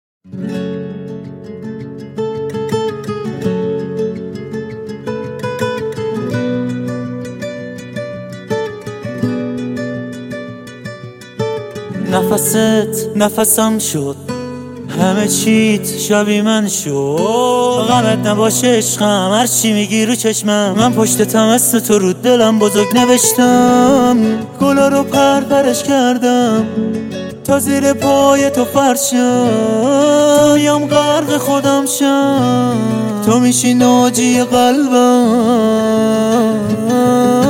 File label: Guitar Version